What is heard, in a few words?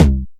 909 lo Tom; Quasimidi Rave-o-lution 309